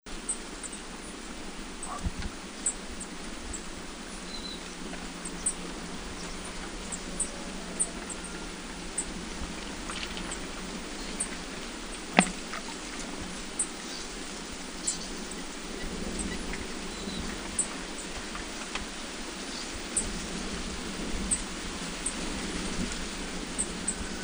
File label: Black Faced Bunting Call; bruant masque emberiza spodocephala ssp indetermine; Guangxi Shanli